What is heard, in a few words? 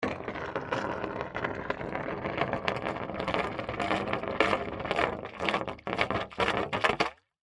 Gurgle Pipe Drain, Water Gurgle Pipe Drain Hose Backup Empty; Dripping; Blastwave